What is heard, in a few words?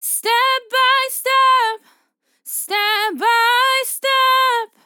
LYRICS Fills 120bpm Fm; step by step